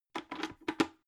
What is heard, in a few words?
bucket handle use